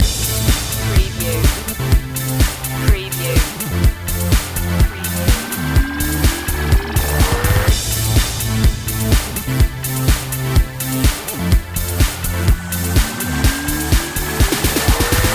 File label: tv loop